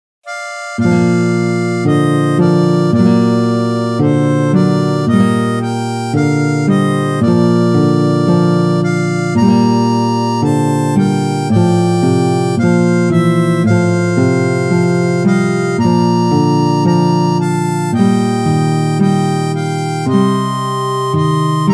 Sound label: Harmonica